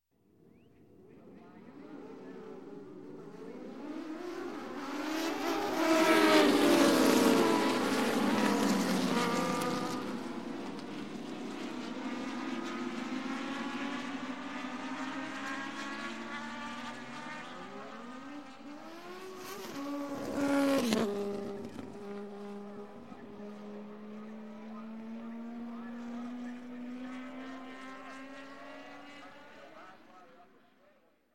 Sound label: Motorcycle, Race Superbike Start Of Race, announ; announcer; Sound Ideas; Series 2000